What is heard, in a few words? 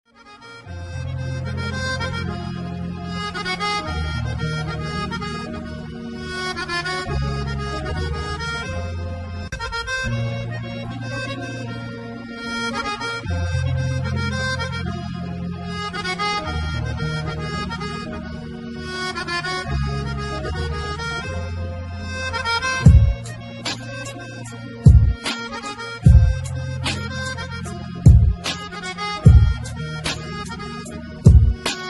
ghamgin bikalam torki